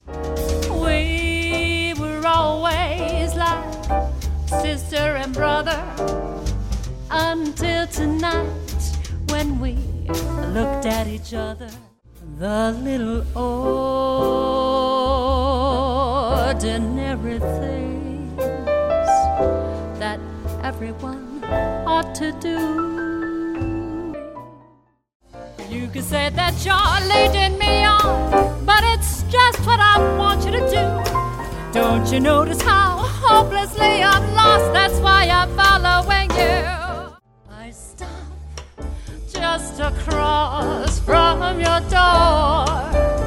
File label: singing